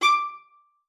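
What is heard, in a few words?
D5, viola spiccato; Xsample VOL-5; 90 s Sample CDs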